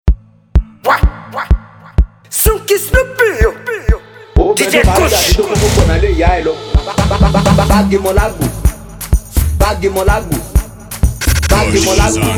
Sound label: Street Anthem